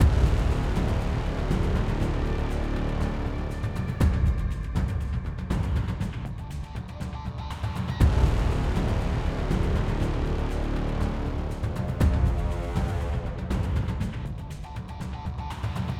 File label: Mood Tension Heavy